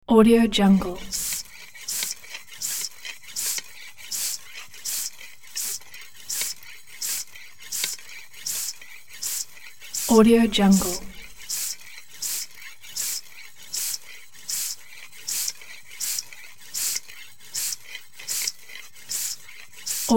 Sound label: bicycle air pump; sound ideas